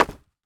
Clay Tile Impact

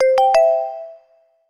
MultiTone UI

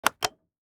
Tight Plastic Button Switch Press

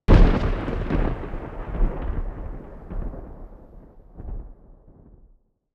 Thundering Lightening Foley

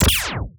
Laser Gun

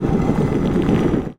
Slab Friction

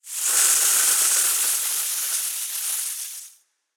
Snake Hiss Longer Dry

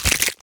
Bone Break Gore

